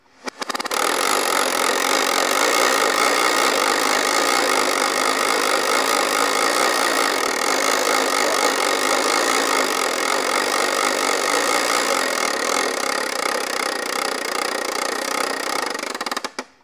Toy Trr

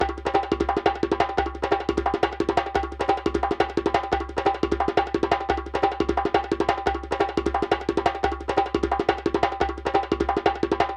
175bpm Classical Running; Freak

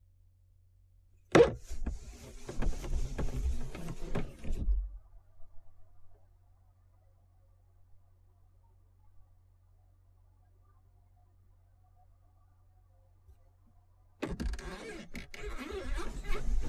Car Manual Window Lift

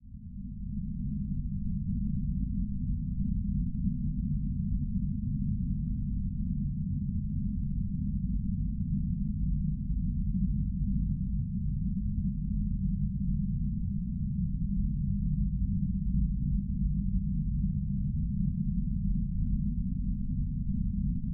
Underground Eerie Breathing Ambience

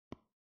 Touchscreen

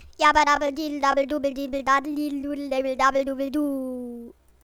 Helium

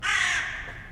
Craw Single Call

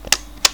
Camera Shutter

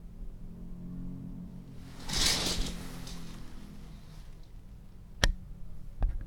Curtain No Effect

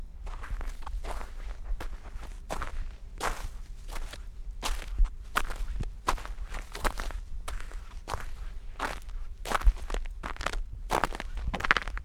Steps In Snow

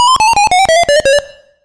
Death Lose

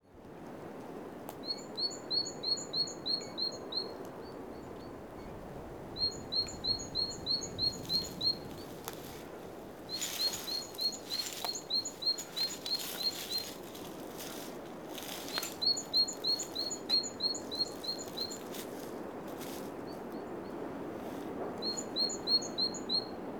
Bird Oostduinen

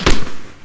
Hit

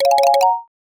Card

